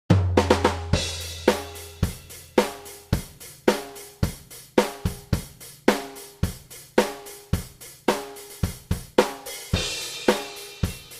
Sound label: drums